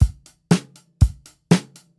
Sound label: Rock Drum Loop